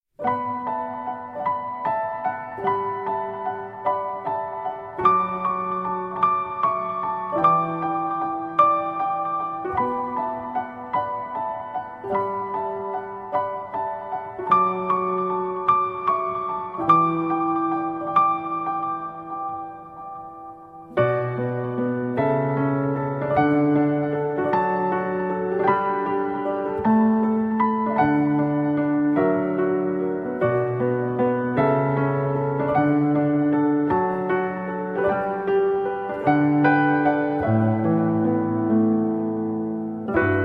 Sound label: PIANO; NICHOL'S PIANO